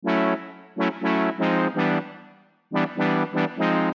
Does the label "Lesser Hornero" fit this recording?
No